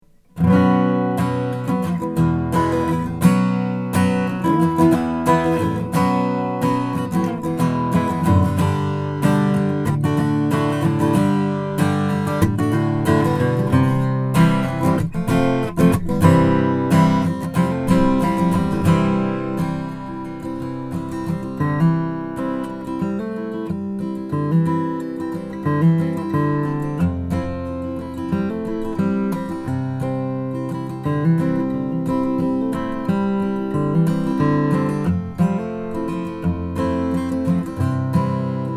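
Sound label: martin custom shop 00 madagascar ros, guitars; gruhn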